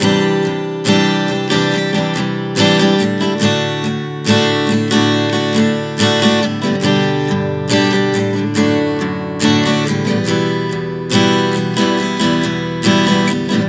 Progression 070 C-D-G-D-Em, Acoustic Guitar, Chord Progressions 070 bpm; Sonic Foundry; Songwriter Acoustic Guitar Companion